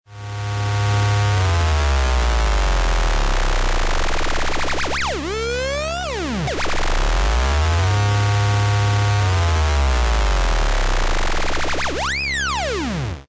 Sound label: bass sweep